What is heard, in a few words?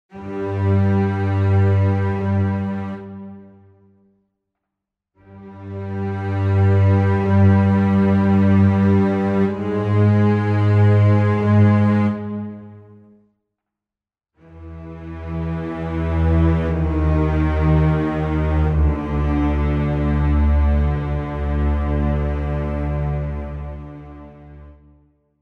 Strings Octaves; Albion One